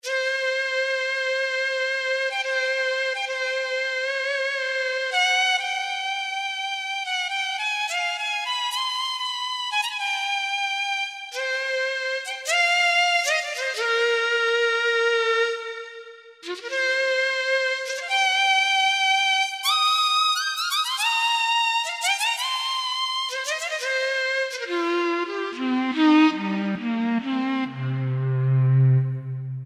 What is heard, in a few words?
Deduk, Roland JD-990; Factory Patches